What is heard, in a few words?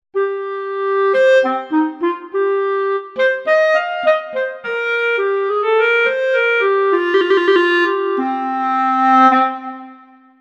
Clarinet